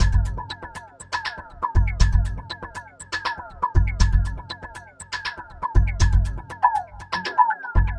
Mod Loop, Modular Percussion

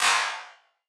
Wider Clap; stereo toolkit samples

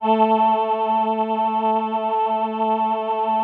VP-330, String Machines

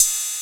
606 Cymbal Open; EMU Xtreme-Lead-1

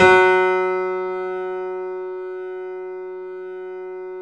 F#, 3D Pianos, Boesendorfer

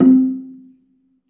BELA C3, BELAFON, Asian Traditions; USB Soundscan vol.60; AKAI

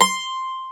mandolin, Stereo Mandolin

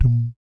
BASS DUM; Spectrasonics; Vocal Planet; Jazz FX

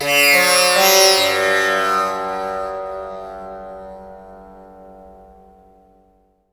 TAMPURA; Spectrasonics; Heart of Asia

